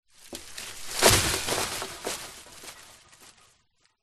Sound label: impacts tree small fall onto wire fence bounce